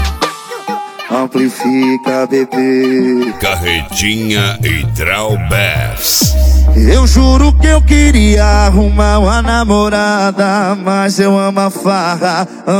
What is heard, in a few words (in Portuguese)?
piseiro